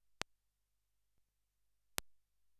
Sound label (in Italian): Martello piccolo Legno Marmo; Prove Martelli